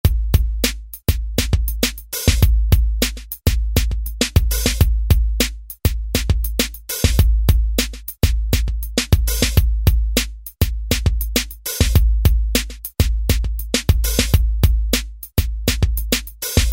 Alesis SR-16; MoonWalk

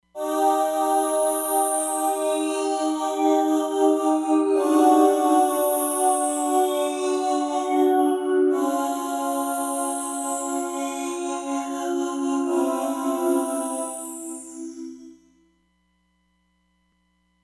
Regeneration Reverse Pan, Roland DEP-5; Factory Patches

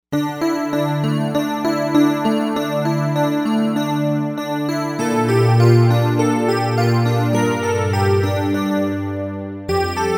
Fantasy, Novation UltraNova; Factory Patches

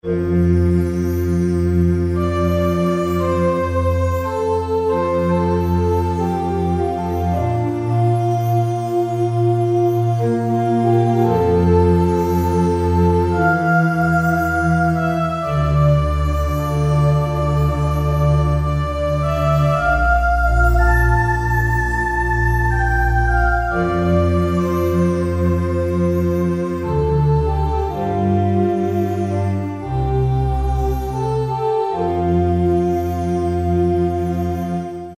Peaceful, Alesis Z3; Sanctuary

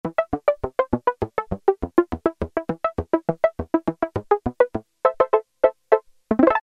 Pizzicato, Roland Juno-106; Factory Preset

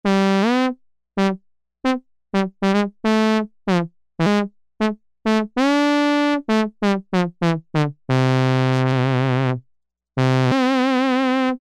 trombone, Korg M-500 micro-preset

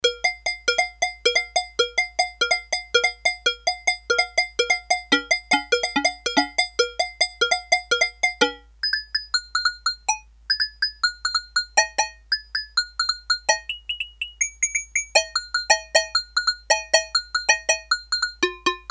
Agogo Bell, Korg DS-8; Factory Patches

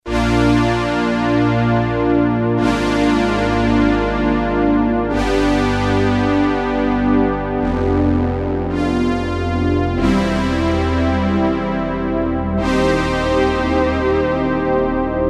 OB-8 PAD

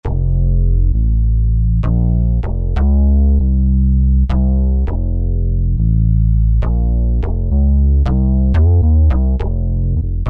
Whappy Bass, Roland SR-JV80-12 Hip Hop Collection

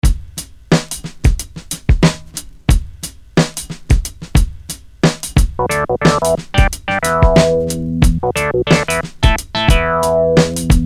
Growling, Orca; FXpansion

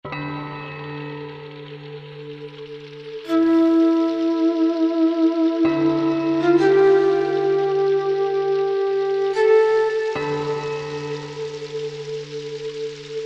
Ethnos, Korg MSC-10S Ethnic; Factory demo songs

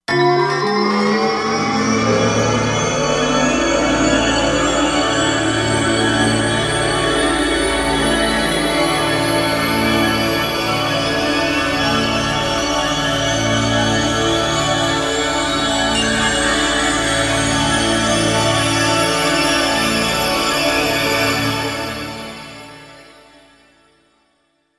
Fx Rising High

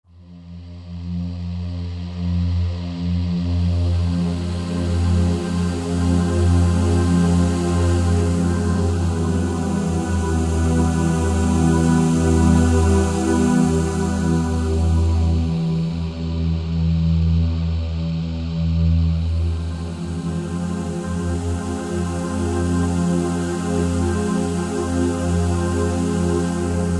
Roland JV-2080, Longing

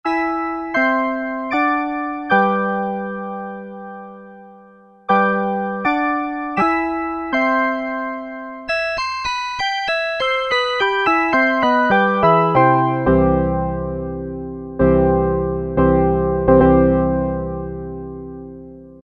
CHIME, Kawai SX-240

